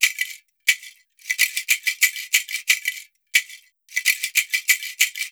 ETHNO PERCUSSION 90; Beats; ethno2

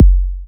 Minimal Kick, Drums, Kicks

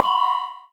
Hard Flit Pling, Drum Kit; Drum Kit 06

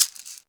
SHAKER

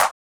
Snares & Claps, One Shots

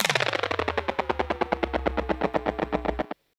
Industrial 140

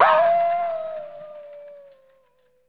WOLF

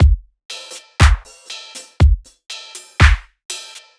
Houser

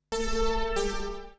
synth tekno 140 A R; Techno2; Keys

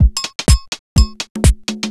HOUSE BEATS 125

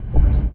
One Shot, Hits

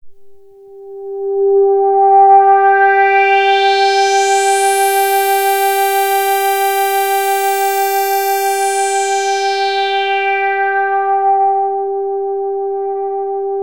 SWEEPER G5, SYNTHS; SE-1

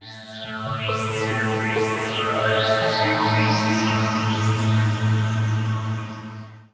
TECHNO PAD 140; Keys

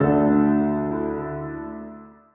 C Keys, One Shots; Keys & Synths; Touch Loops; BlissedOutBeats

